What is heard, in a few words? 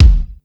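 bass drum room; 300 Drum Machines; Yamaha Motif ES-Series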